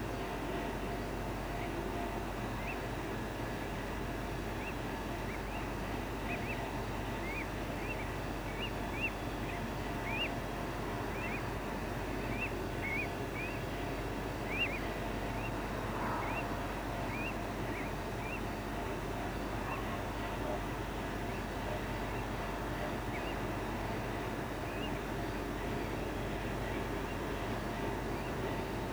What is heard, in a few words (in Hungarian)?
parti lile hangja